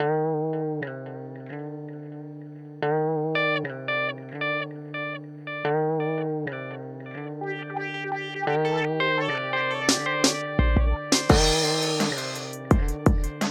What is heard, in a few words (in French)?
Cordes Ambiance